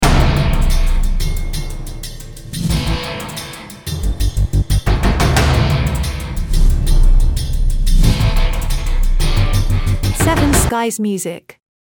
Action Drums Teaser, Loop

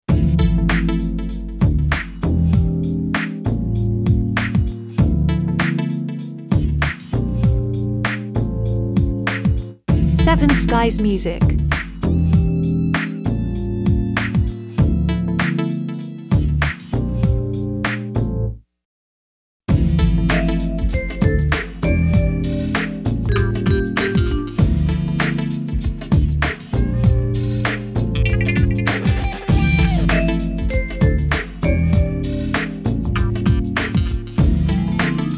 Cinematic Hip Hop

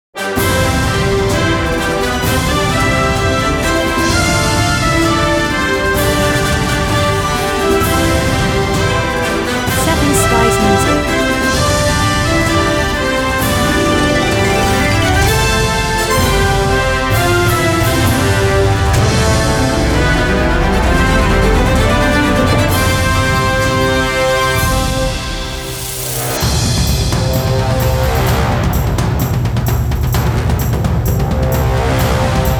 Epic Canto

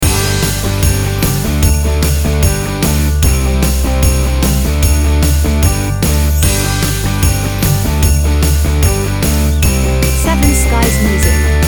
Christmas Advertising - Long Loop

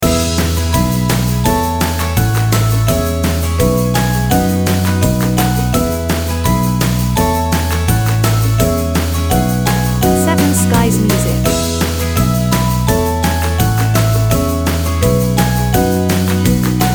Cheerful Promo Loop

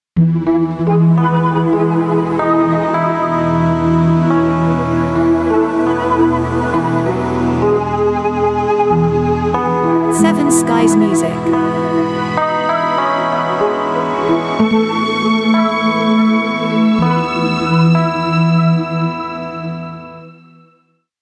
Stride

